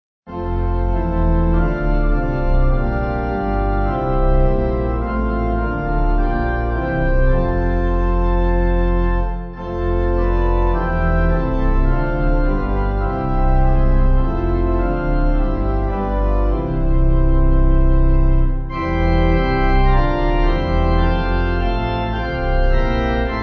pipe organ

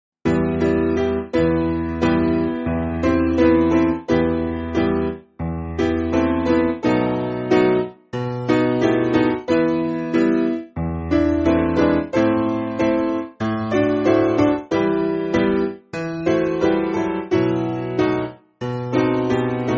Piano, Music Backing Files for Churches; Father In Heaven Grant; Halad

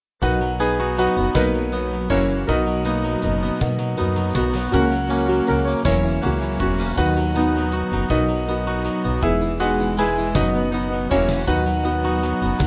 Band

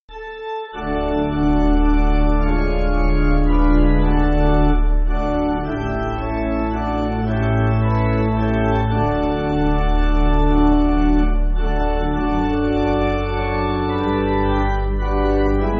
Jesus We Look To Thee, pipe organ; Rhoads 6.6.8.6

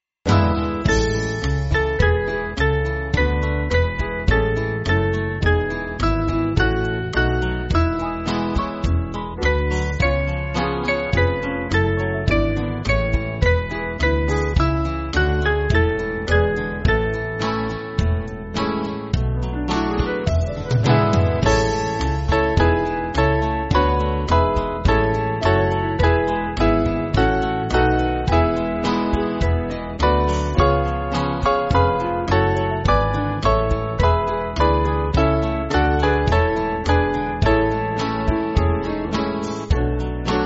Band, Free Music Backing Files for Churches; St Timothy; 5/A

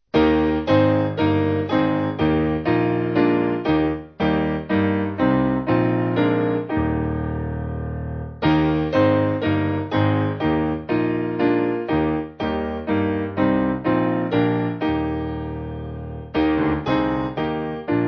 piano

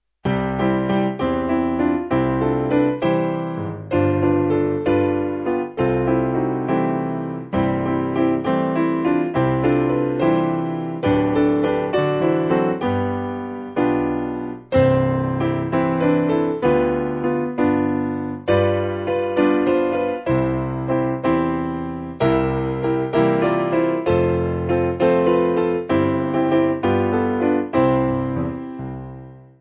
piano

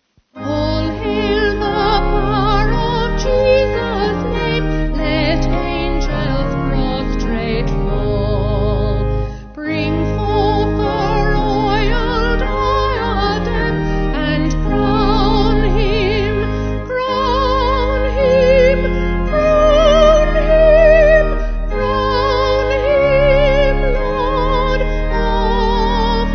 Vocal Organ; All Hail The Power; Miles Lane

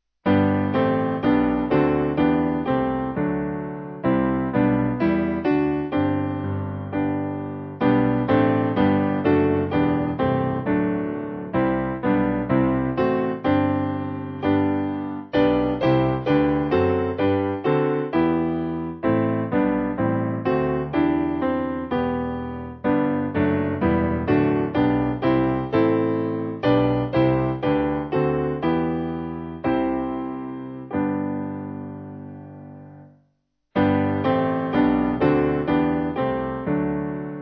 Gentle Mary Tempus Adest SPiano

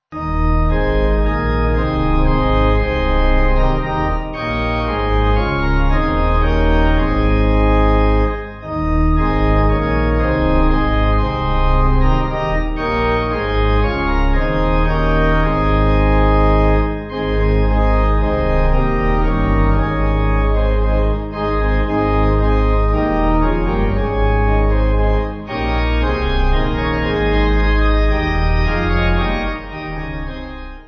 Pipe

